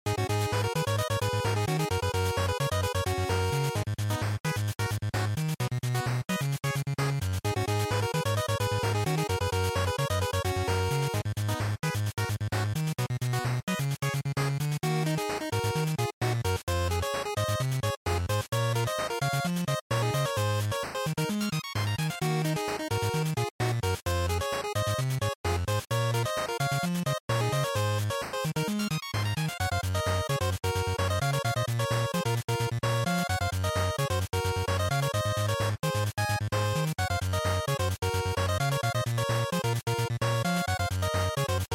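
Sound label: Game Boy Action Stage, bgm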